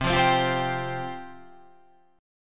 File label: C Suspended 4th C